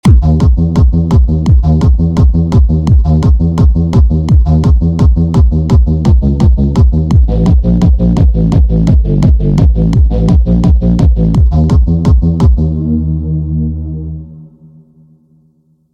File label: Bass